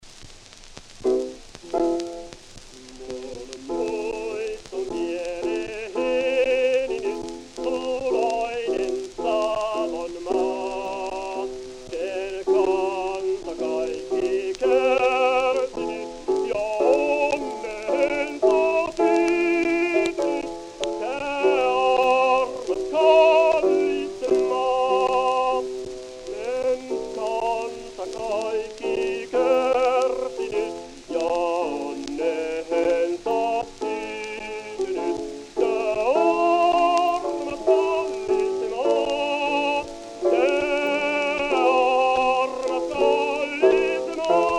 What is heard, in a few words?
Gramophone